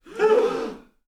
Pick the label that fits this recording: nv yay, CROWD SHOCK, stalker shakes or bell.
CROWD SHOCK